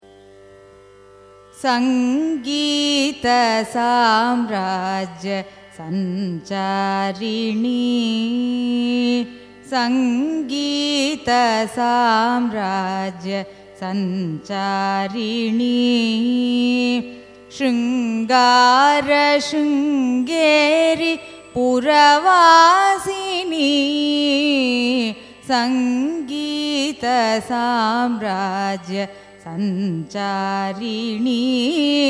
raga mohana kalyani carnatic; carnatic music

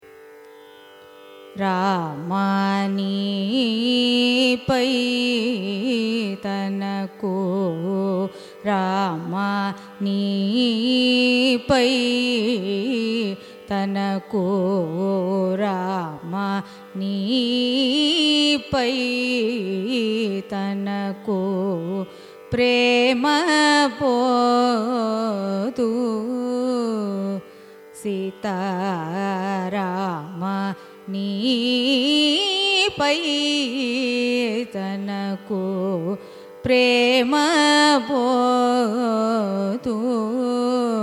raga kedaram carnatic; carnatic music

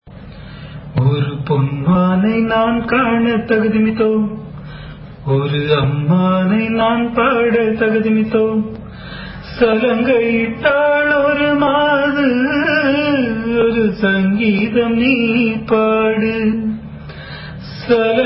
raga bowli cine

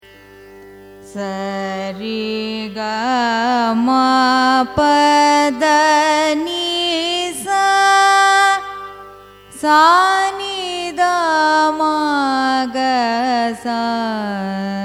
raga saramati arohanam avarohanam, carnatic music

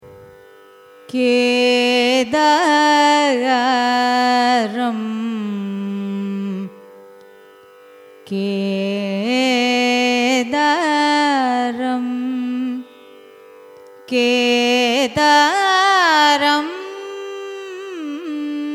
raga kedaram signature; carnatic music

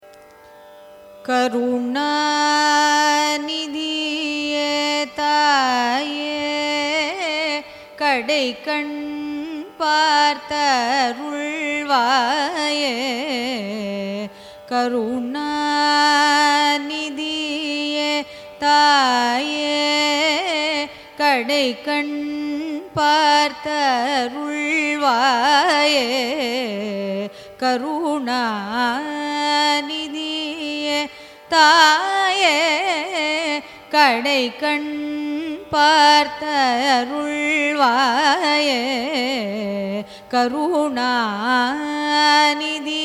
raga bowli carnatic; karunaanidhiye

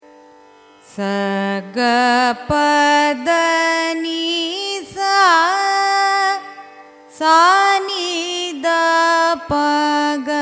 raga valaji arohanam avarohanam; carnatic music

